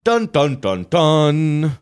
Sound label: dun dun dun dunnn